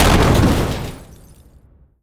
dog entry land; npc; scripted

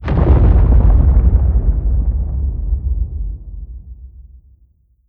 deep step long, strider; creatures; Half Life Model Pack

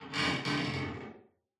ambient wood creaks

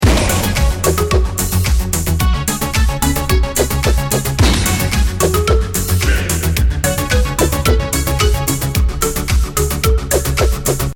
start action

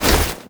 mantle, foley

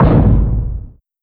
step detail, strider; creatures; Half Life Alyx

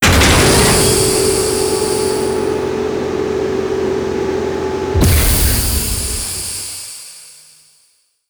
run empty, trash compactor

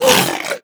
attack miss, zombie; creatures

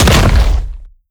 step building; creatures; strider; seq passby

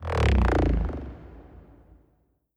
strider descend; creatures; Half Life Alyx

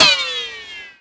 ricochet, bullet impacts; Half Life Model Pack

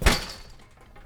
metal chainlink, footsteps; player

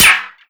npc charger shield, bullet impacts; physics; Half Life Model Pack

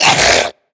choke, zombie vox; creatures

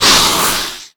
attack screech, headcrab black; creatures; vox

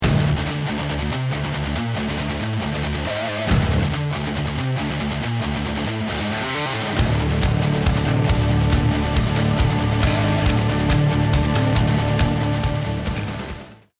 start action, music; hades

